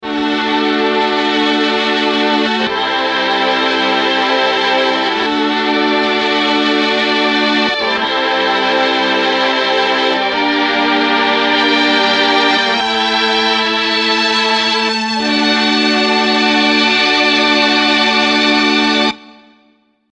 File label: violins